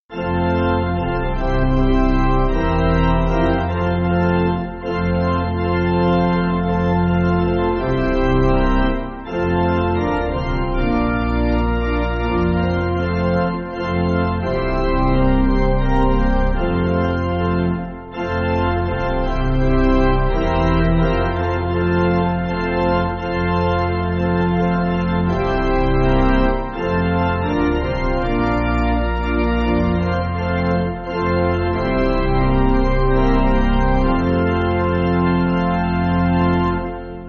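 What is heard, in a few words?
Pipe Organ, Free Music Backing Files for Churches; O Lord Remember Me; Hull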